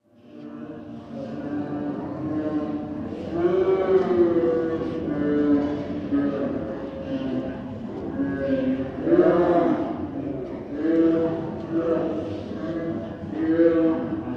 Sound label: Drunken singing football fans in bar